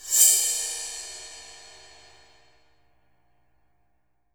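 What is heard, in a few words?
cymbal scrape, drum; 90s sample CD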